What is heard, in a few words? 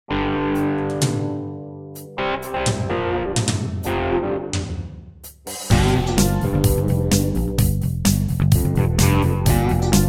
pop rock